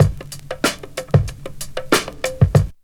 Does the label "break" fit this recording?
Yes